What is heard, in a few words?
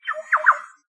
green oriole